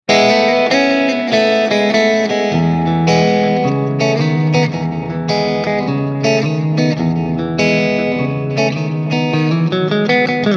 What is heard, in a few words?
middle clean, pickups